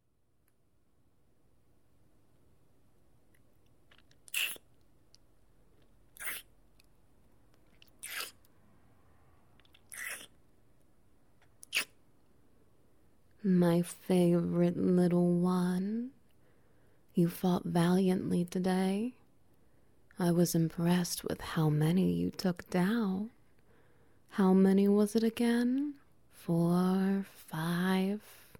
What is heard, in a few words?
Wet Noises; Orcs can be Cuddly